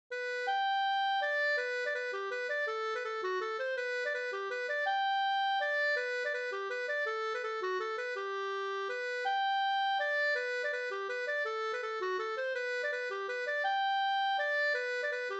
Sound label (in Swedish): mazurka; fr Ersnas Nb